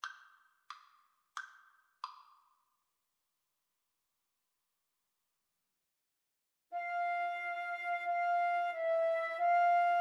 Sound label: Flute Duet